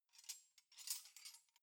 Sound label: cutlery knives handle soft